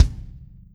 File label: kick